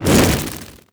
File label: buzz, antlion wings; creatures